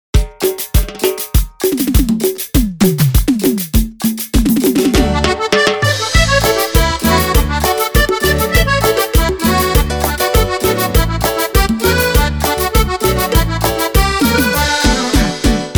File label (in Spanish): Fantasia Cumbia Caliente Sabrosona